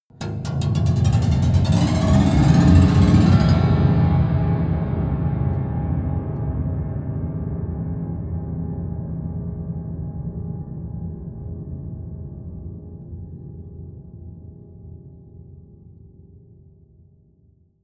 piano twang accelerate low

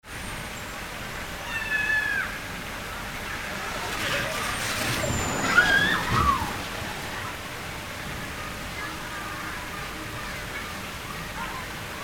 open air bath waterslide

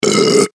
belching

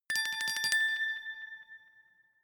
glockenspiel sparkle tremolo low octave short

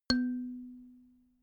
notification kalimba single low

